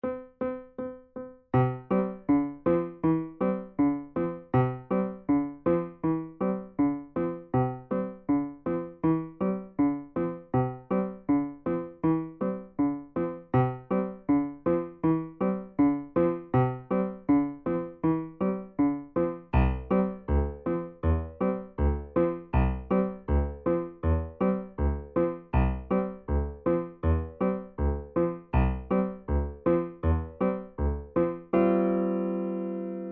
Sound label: Sailboat 160 Secondo